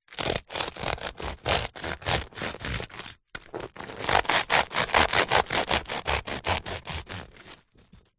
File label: food bread baguette dense crispy cut bread knife